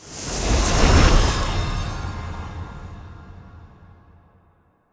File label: mystery Stack Disolve; conan